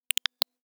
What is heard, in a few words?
worked out, notification; message tones